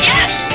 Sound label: grab; sfii